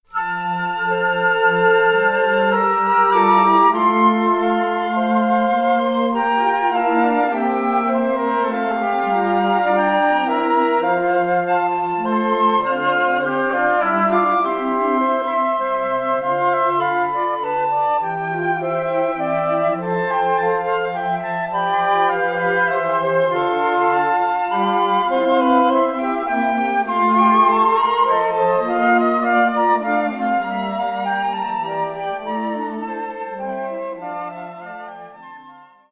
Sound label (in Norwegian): Fiolinkonsert D moll Andante